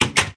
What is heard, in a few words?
close